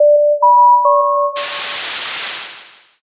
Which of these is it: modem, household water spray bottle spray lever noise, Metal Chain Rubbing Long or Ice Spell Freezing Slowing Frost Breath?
modem